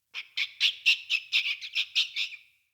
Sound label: Witch Station Rat Chatter; Ambience; Soul Plane